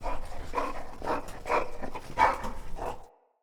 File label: Wolf Idle Open Mouth; Animals; Vocals